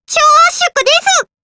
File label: reloading